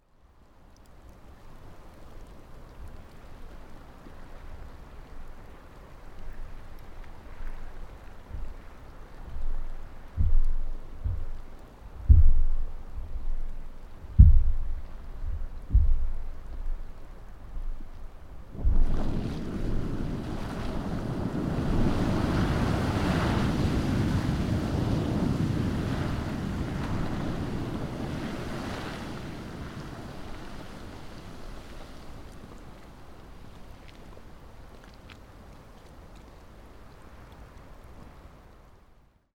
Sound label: Black Sand Pool